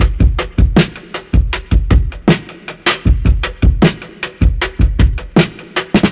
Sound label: Drum loops Funky